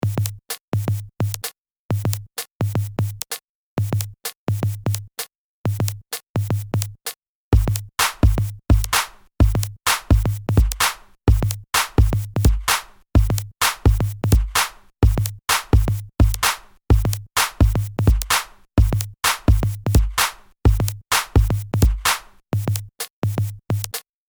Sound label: small beat and support